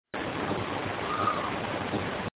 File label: scream female; paranormal; elsings 2nd hand shop